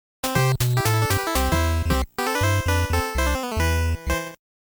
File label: Mistake Game Over; Game Sound Museum Special Edition; UG Quinty 2004; Mistake~Game Over (2 Player Simultaneous Play Only)